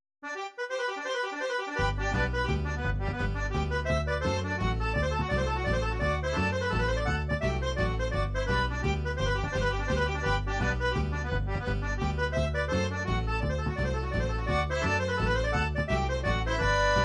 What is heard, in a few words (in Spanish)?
polkas nortenas